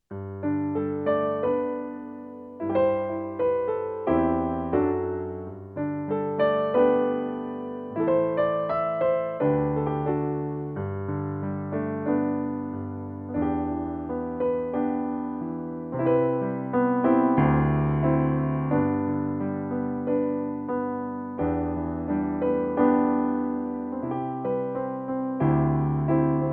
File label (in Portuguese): Aleluia PIANO